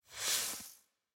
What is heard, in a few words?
Corpses; Blind Quest - The Frost Demon